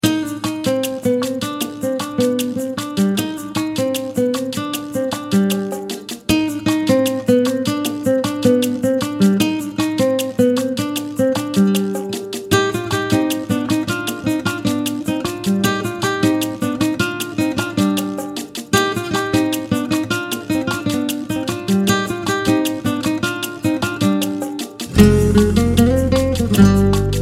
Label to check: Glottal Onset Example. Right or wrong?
Wrong